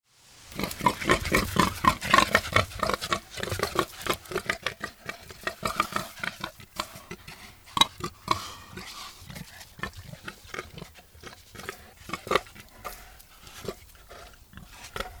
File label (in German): Wildschwein Bache Grunzen; Wildschwein, die Anpassungsfähigen